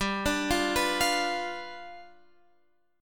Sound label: G 7th G down